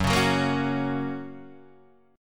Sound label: Gb Major Gb, guitar; strummed